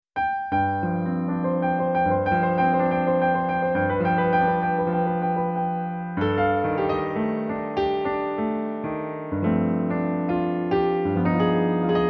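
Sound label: piano vocal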